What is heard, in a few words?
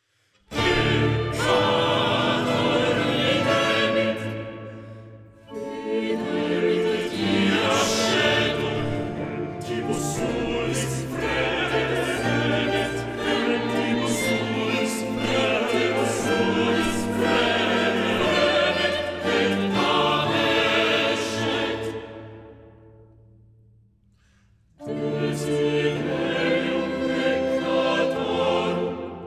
vespers